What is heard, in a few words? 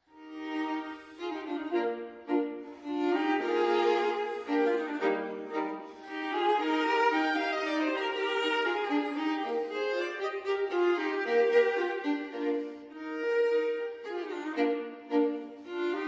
Duo for Violin and Viola Minuet; loops